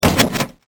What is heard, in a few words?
latch locked